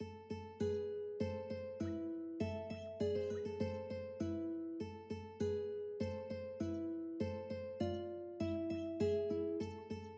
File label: Sivivon ukulele